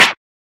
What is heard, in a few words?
garbage in small; quatuor